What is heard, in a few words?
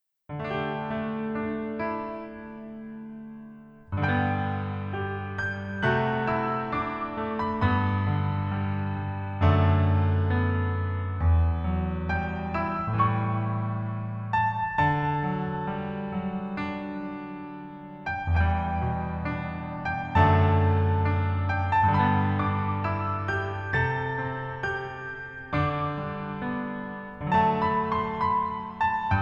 Piano ver, 멜로디MR